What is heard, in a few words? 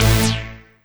Synth Hit A